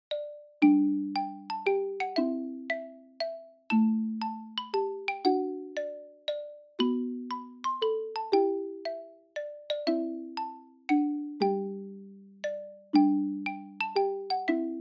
Shuffle; We Wish You A Merry Christmas

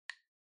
Smartphone Typing Speaker Single

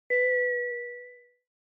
Single Accent Low

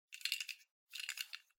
Stopwatch Mechanical Noise

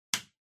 Power Strip Floor Switch

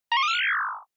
Motion Pop

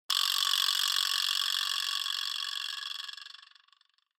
Vibraslap Mid

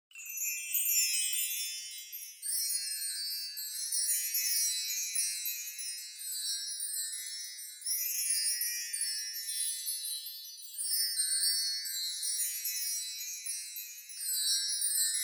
Wind Chimes Long

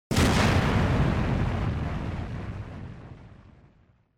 Explosion Long

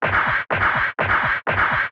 Retro Anime Robot High

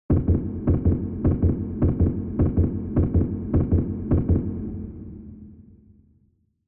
Heartbeat Fast Reverb

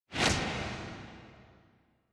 Motion Grab Reverb

